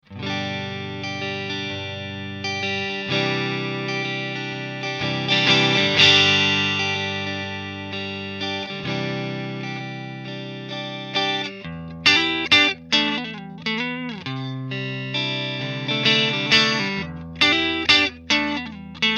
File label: Strat Am Pro JCM800 clean mastil